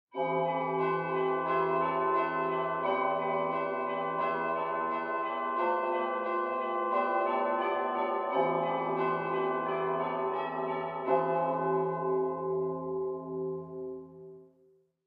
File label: Church Bells Short; bell towers